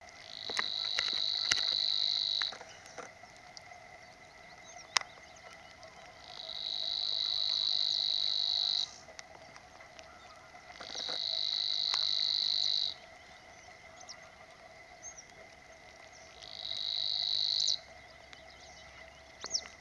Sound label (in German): Schwirl